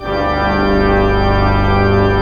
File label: PED, Pipe Organ